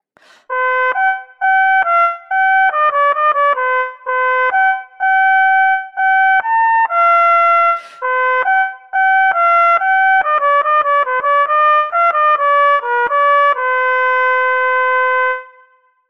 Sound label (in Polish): Cynk